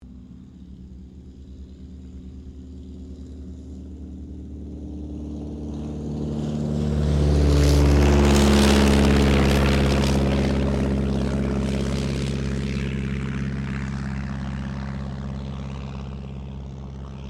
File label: AIRCRAFT DH82A DEHAVILAND TIGERMOTH TAKEOFF; Mixed selection of Tigermoths from the 1940s